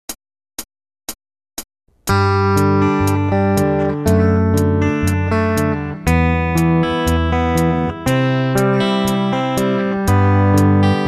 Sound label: Rhythm Guitar